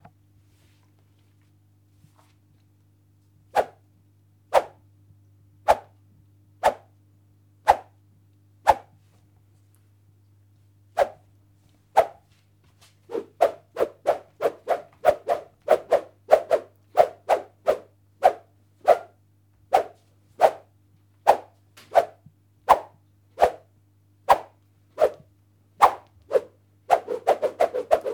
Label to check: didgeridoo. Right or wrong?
Wrong